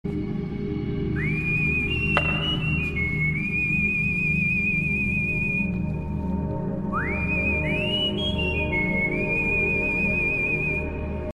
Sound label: whistle ringtone; bhaagjohnny